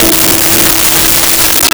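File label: Jazz Guitar E5; Nintendo; July 2020 Gigaleak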